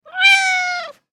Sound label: cat meow